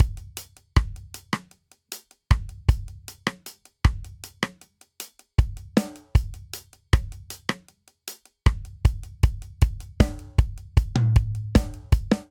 Straight Reggae Verse Variant 78 bpm; 4 4; MIDI Clips; Ableton; Factory Packs; Drum Booth

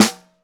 Snare Bottom

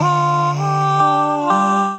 Combined Rack (Root D), Basic Vocal Racks; Voice Box; Harmonic Vocal Racks

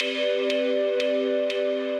SciFi Lobby, Synth Lead; Ableton; Factory Packs; Synth Essentials

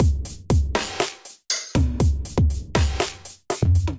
Kit, Drums, Hybrid; Ableton; Factory Packs; Drum Essentials

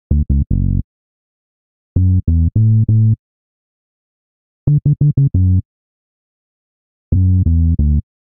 Bassline F# Minor 85bpm; MIDI Clips; Beat That; Ableton; Factory Packs; Chop and Swing

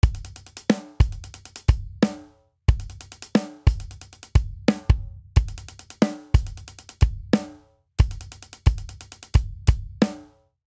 Swing Hip Hop 07 Outro 90 bpm, drum; Ableton; Factory Packs; Drum Booth

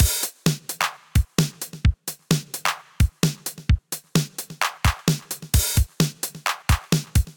707 130 Groove, Drum Machines; Ableton

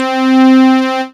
synth pad; Ableton Foundation FreePacks; Foundation Digital Synths

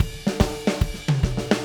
145 Texas Shuffle Drums; Pop Rock

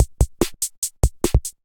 Kit groove 145 bpm; MIDI Clips; D55 Lifeline Kit groove; Ableton; Factory Packs; Drum Essentials

